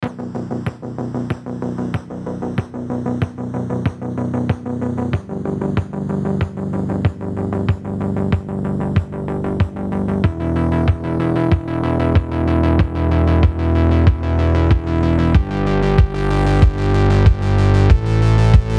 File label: synthwaves